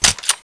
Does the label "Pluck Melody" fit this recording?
No